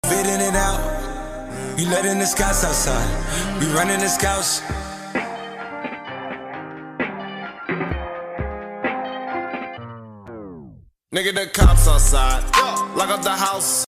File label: Tik Tok ringtone